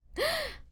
Susto

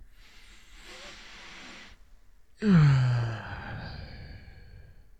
Suspiro

